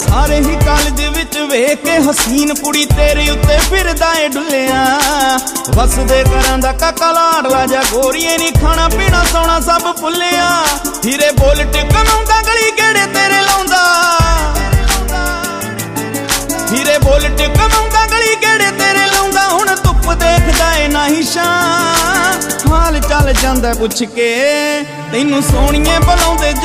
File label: Jelly Punjabi